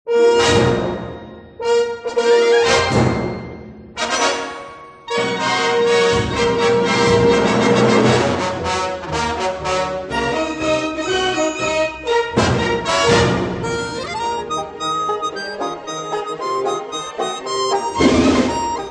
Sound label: Main Title instrumental